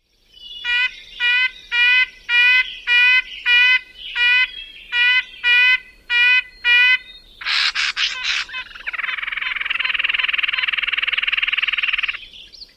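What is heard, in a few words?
REBRNU toot and interaction calls, red-breasted nuthatch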